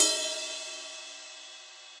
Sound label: Ride Bell Bright Room; Drums; Drum Hits; Standard Hits; Ableton; Session Drums Studio